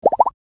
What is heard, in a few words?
Bar chart pop in animation infographics